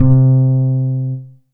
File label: Yamaha TG500 BA Wood C3